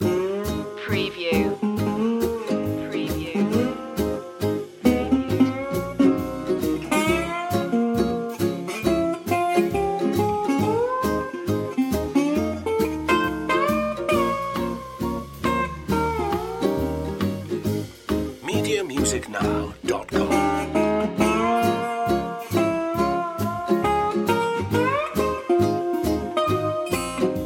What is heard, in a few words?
tiki beach loop